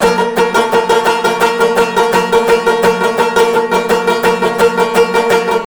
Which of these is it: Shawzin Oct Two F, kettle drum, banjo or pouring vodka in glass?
banjo